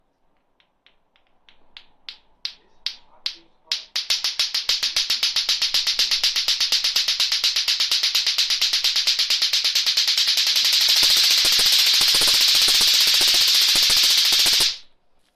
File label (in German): klick klack schnell